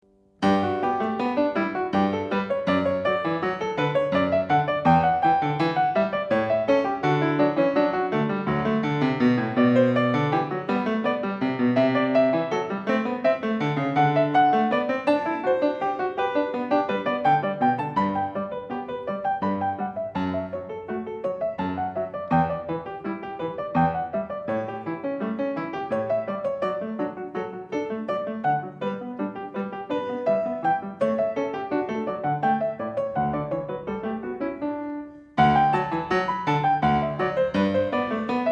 Bach Goldberg Variations a 2 Clav, PIANISTS

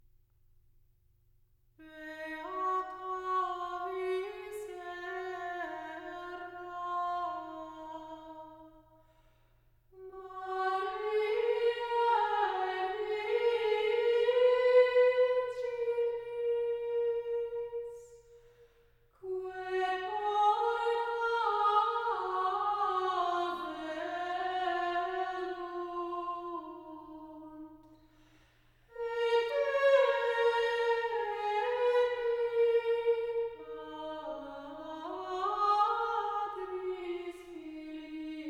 chant & song; Communion; Beata viscera; An English Ladymass

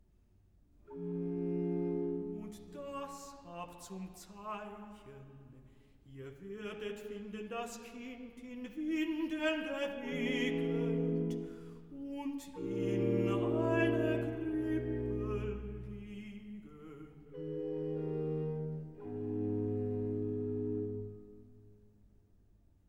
Recitative; Und das habt zum Zeichen; BACH; Christmas Oratorio

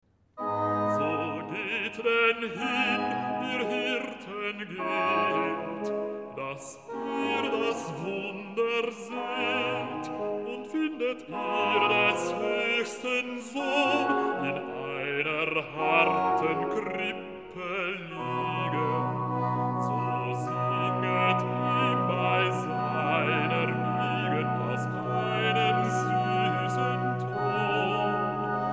Recitativo (Basso); So geht denn hin; BACH; Christmas Oratorio

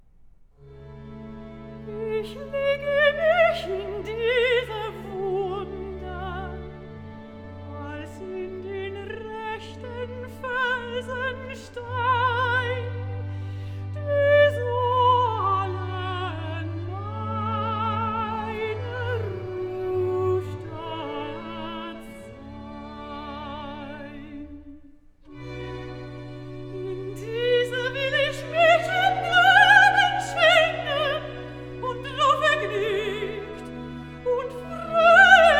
Recitative; Ich lege mich in diese Wunden; Bach; Cantatas; BWV199; Mein Herz Schwimmt in Blut

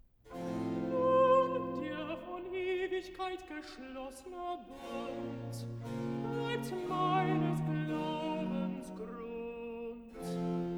Recitativo (Alt), BACH for ALTO; Was Gott tut das ist wohlgetan II; Nun der von Ewigkeit geschlossne Bund

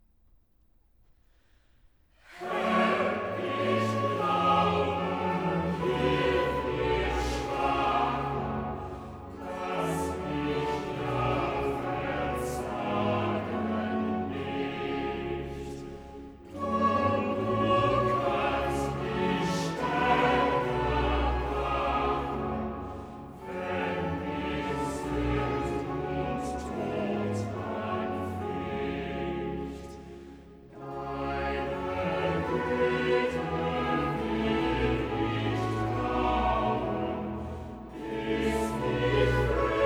Choral; Bach; Cantatas; Jesu, der du meine Seele; Herr ich glaube hilf mur Schwachen

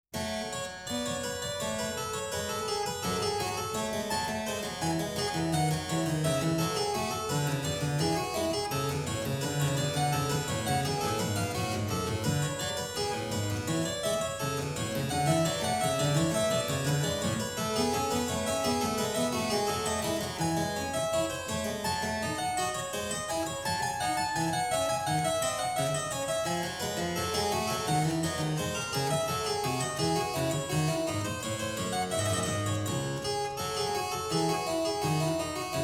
Prelude in F-sharp minor, clavier; Prelude & Fugue No.14; BWV 859; BACH; WELL TEMPERED CLAVIER; BOOK I

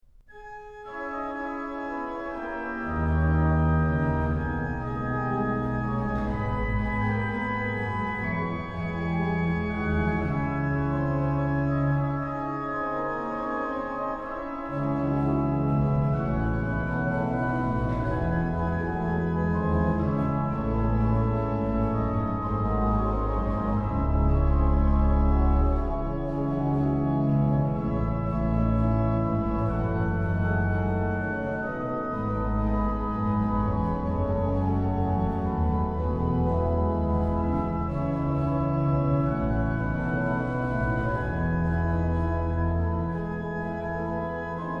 organ; BWV0627-1; Christ ist erstanden Vers 1; BACH; BACH ORGAN WORKS; CHORALE PRELUDES

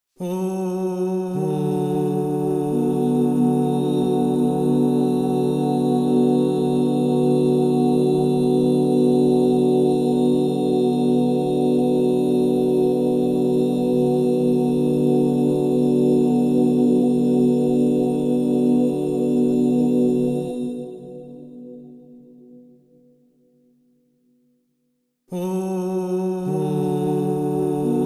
Si minor, crystal bowls; Yoga and sound

